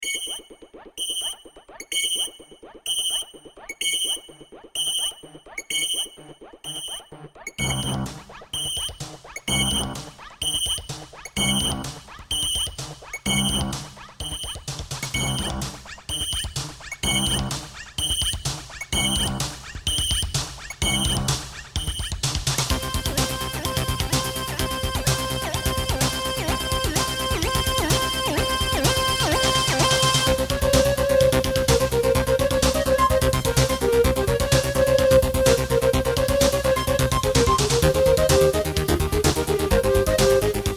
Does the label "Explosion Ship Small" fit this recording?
No